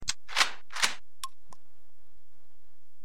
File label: Reload Shotgun